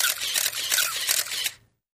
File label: 35mm Camera Auto Win; shutters & movement